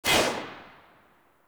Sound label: Rifle Shot; Warframe; Tenno; Tenno Bard Rifle